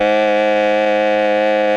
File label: OBOE; ILIO; Propeller Island Legendary M400